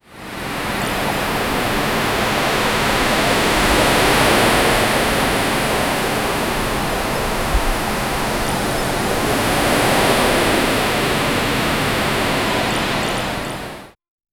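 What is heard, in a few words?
beach city with the sound, coastal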